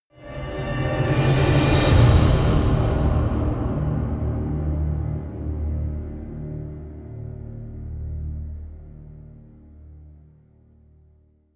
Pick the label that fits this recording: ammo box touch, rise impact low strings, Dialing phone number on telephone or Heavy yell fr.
rise impact low strings